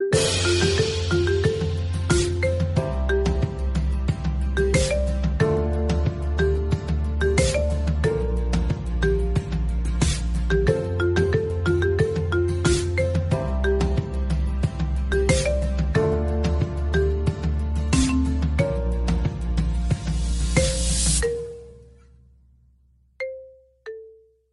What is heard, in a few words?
childish ringtone